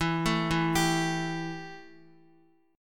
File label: E Minor Double Flat 5th E Guitar; Embb5 arpeggiated; x,x,4,2,4,3